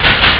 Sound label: train; ST-XX Amiga Soundtracker Sample Packs